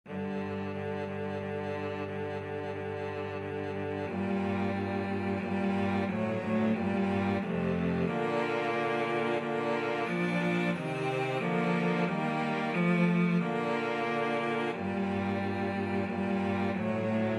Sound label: pavane, cello trio; capriol